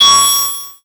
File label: Beep High Pitch; Adobe; Multimedia Internet CD-Rom Flash